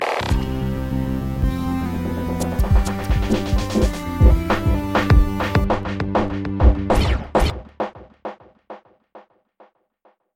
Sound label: instrumental